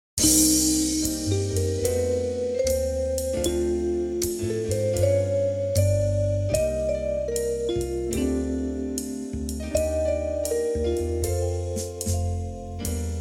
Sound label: Alto Sax; ALTO PERFORMANCE